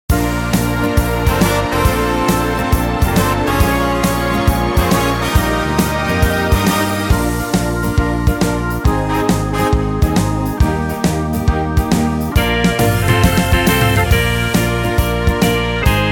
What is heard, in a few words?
Schlager Shuffle